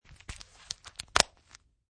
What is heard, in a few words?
Buttoning Metal; Buttoning up metal buttons on a leather wallet - Variation 3; Michael Huang